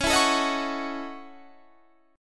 Gb Minor 13th Db

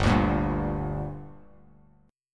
G Minor G, Bass Standard; strummed; 3,5,8,0; JGuitar

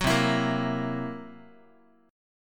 C 7th Flat 5th C down Guitar; C7b5 strummed; 9,8,7,8,x,x

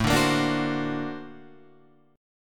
Bb Augmented Major 9th A

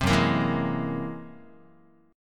F sharp 9th Flat 5th F sharp Guitar; F#9b5 strummed

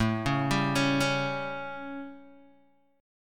A 7th A, Guitar DADGAD; chord

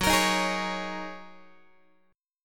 Gb 6th Add 9th Gb down Guitar; strummed; Gb6add9; JGuitar

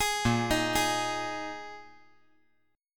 A sharp 7th Suspended 4th A sharp

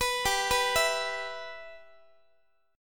G 6th, Guitar; G6 arpeggiated; x,x,14,17,15,15

